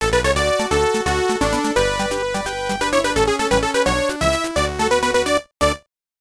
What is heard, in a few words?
Game Set; Pro Yakyuu World Stadium 91; TurboGrafx-16